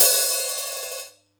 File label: Yamaha EX5, Drums